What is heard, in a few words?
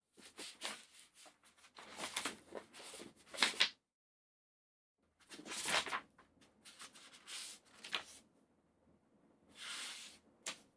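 turning pages; perevorachivanie stranic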